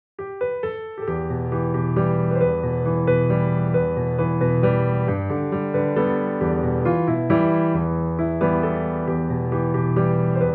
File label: piano